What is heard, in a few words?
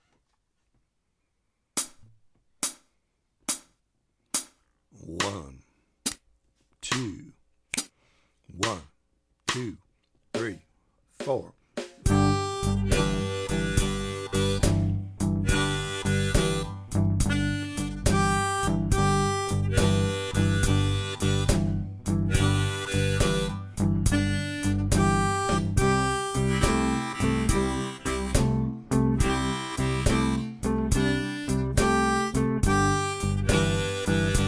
Instr ACOUSTIC; BIRD